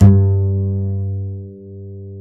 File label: DBASS G; Univers Sons; Jazzistic